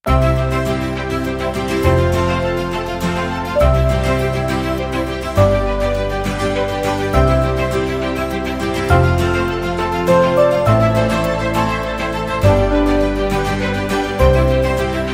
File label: Acoustic Bed Instrumental